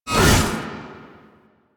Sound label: Whip Swipe; Melee; Dagath